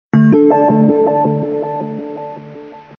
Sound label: Unique Message Tones